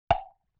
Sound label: Temple Block Hit; Vintage Cartoon; Drums & Percussion